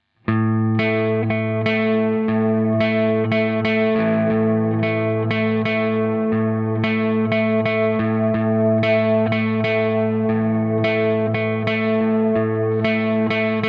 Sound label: Epiphone No Piano